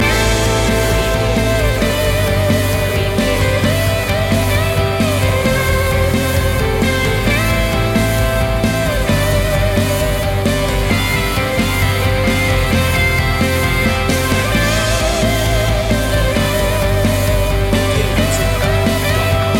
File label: heartbeat loop